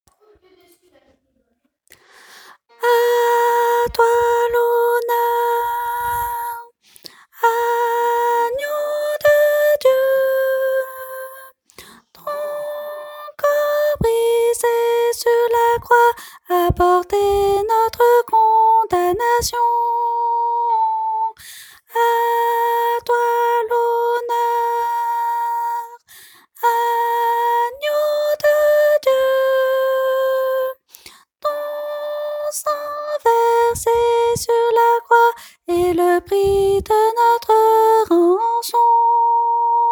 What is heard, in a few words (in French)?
ténor